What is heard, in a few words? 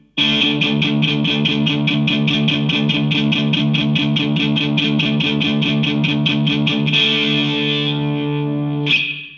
riff